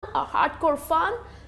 Hardcore

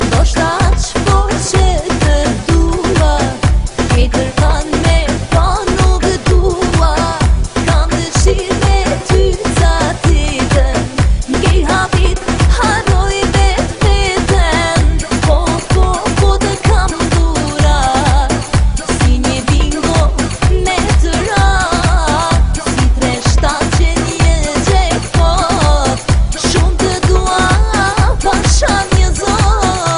Shqip

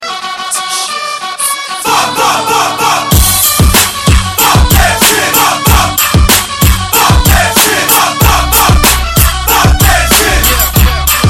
Rap bass new

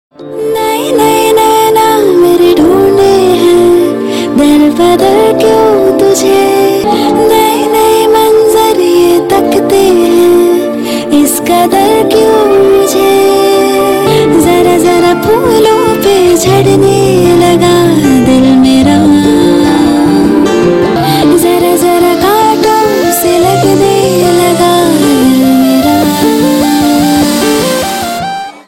Female

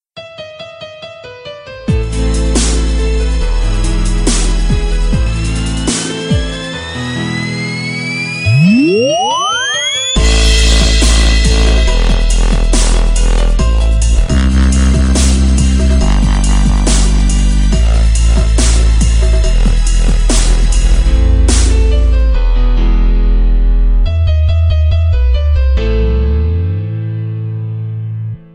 Music Remix

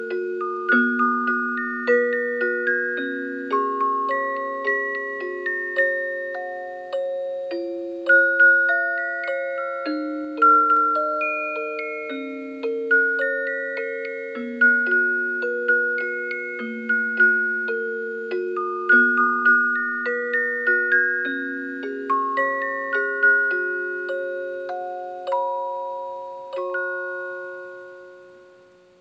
Lucky Cute Tone

